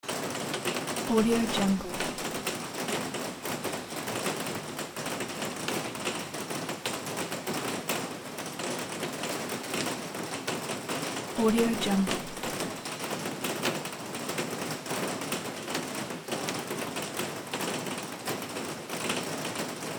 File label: rain on roof